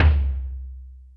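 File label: orch bass drum; Greytsounds; Scott Peers Sound Engineering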